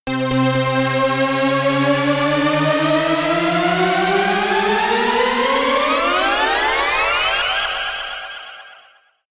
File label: synth riser